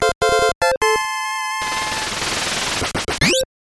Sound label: Stage Clear; Type-B